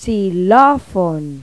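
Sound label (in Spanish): XILOFONO, xylophone; Pipo-Aprende musica con Pipo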